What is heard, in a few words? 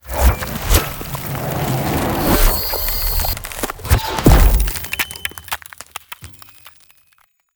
Ice Spell Freezing Slowing Chill Ray; Arctic Aura